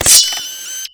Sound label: blades out, roller mine; Half Life 2